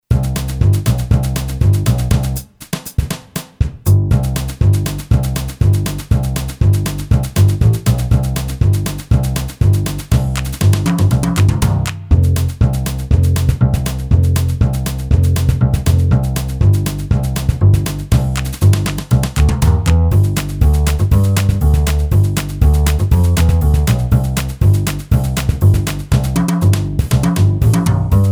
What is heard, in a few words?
COUNTRY, Boss DR-3